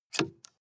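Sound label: sports car interior ventilation adjust hard; verkehr transport; autos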